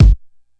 short reverb kick g sharp key, Royality free kick one shot tuned to the G# note